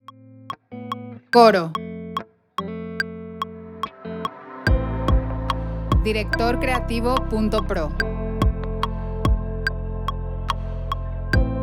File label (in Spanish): Vida Encontre, 72bpm, 4/4, C